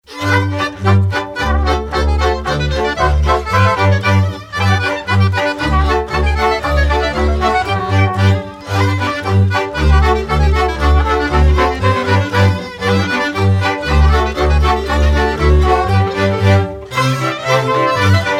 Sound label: polka